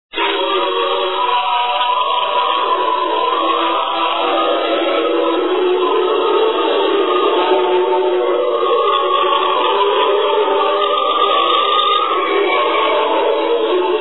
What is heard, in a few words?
singing